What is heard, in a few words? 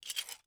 ui pickup metal; Rust